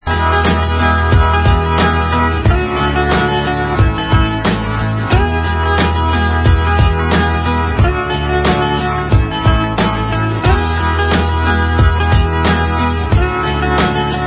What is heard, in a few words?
music; Audrey Pony Daycare